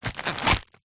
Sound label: first aid kit pouch fabric cellephane wrapped bandages remove